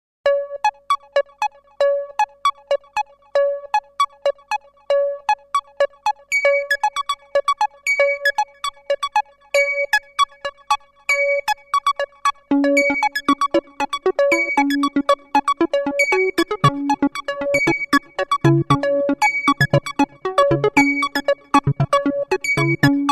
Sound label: synthex fourtrack